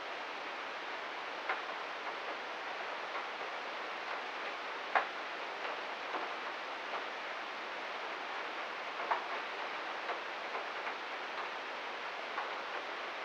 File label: store walking